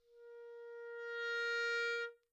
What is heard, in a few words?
sneakin no tongue, clarinet articulation